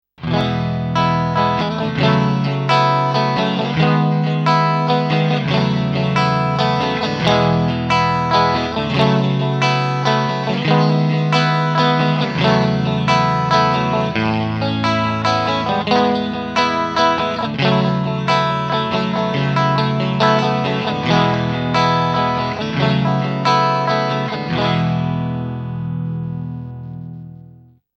Maxon CS9 Pro Slow & Deep Normal

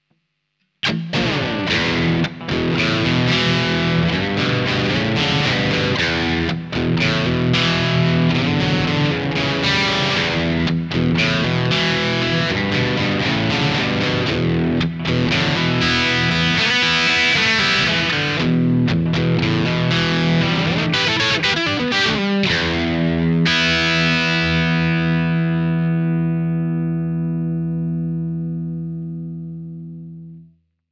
MAXON CS550 Bypass

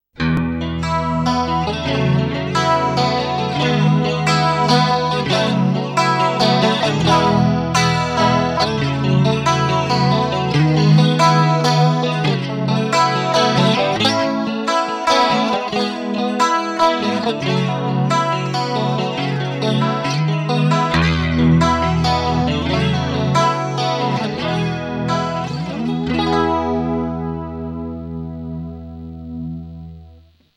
Maxon CS9 Pro Slow & Deep Effect

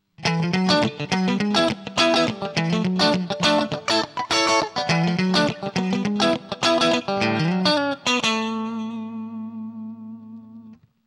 MAXON CP9PRO+ Bypass

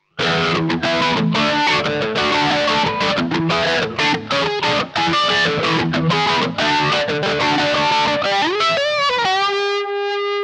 MAXON PT9PRO+ Smooth & Warm

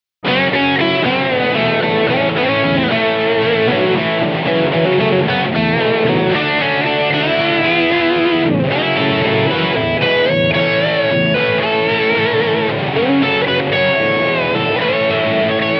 MAXON OD9PRO+ Classic Drive

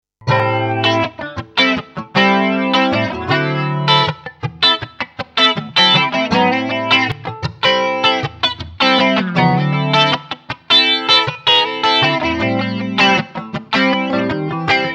Maxon FL9 Smooth Flanging Effect

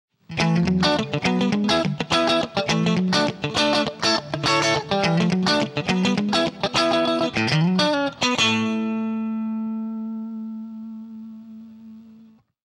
MAXON CP9PRO+ Cutting

